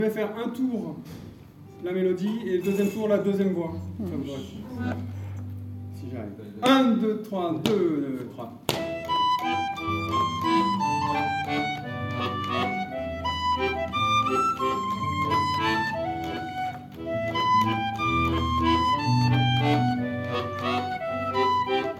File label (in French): Valse